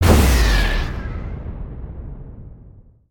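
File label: Fire Tornado Mid Blast; Spinjitsu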